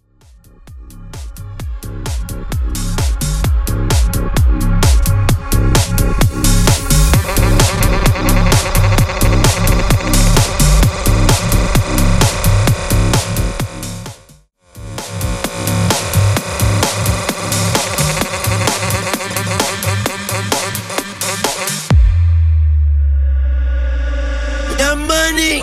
Tech House 130BPM

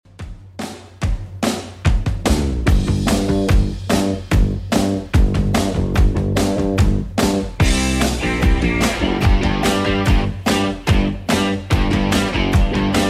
70s Redrum 146bpm CLEAN

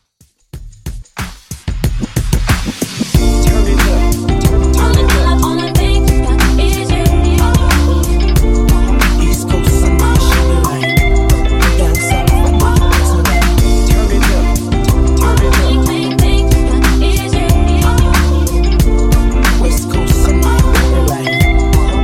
Hype Dj Intro Outro 92Bpm

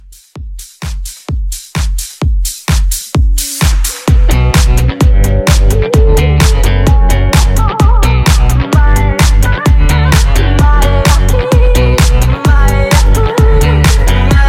Dance Mashup 129

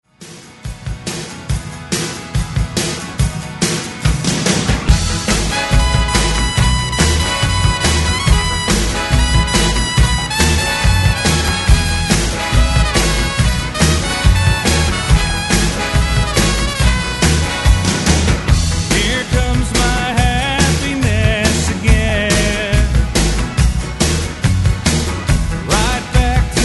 90s Re Drum 141 Bpm; DJDOLLS

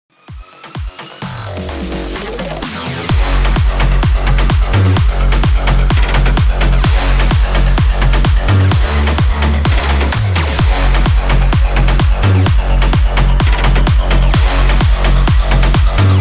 128 BPM

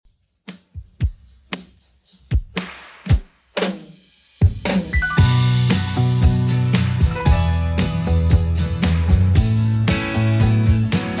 70s Redrum 115bpm

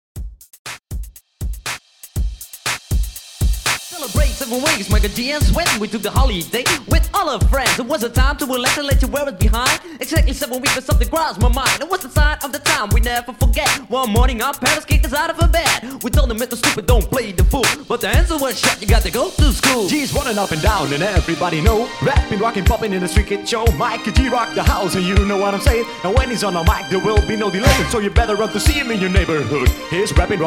MASHUP 120 BPM

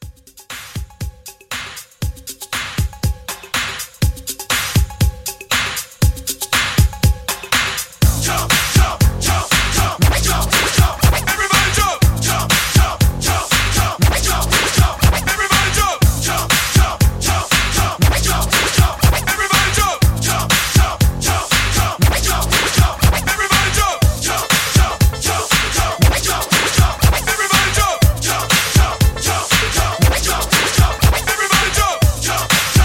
80s Hype Scratch Re Drum 120

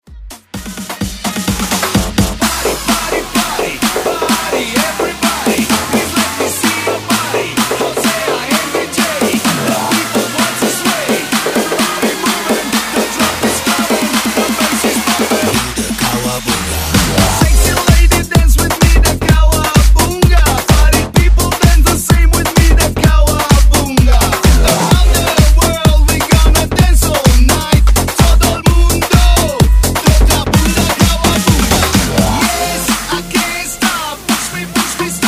Afrobeat; Redrum

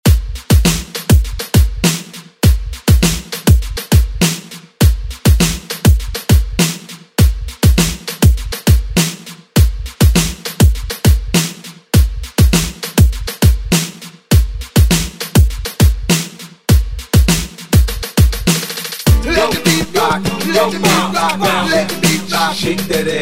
Refresh Redrum Hype

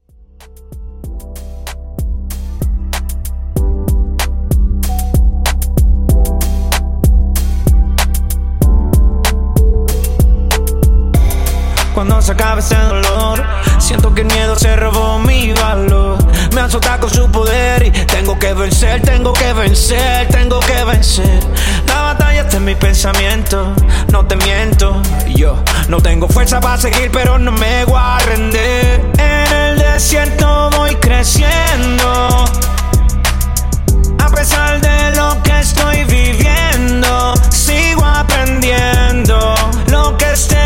95Bpm; Redrum

